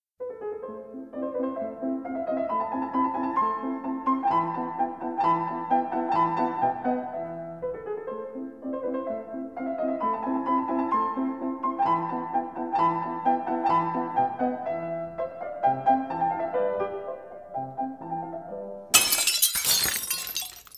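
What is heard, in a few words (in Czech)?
hudba, music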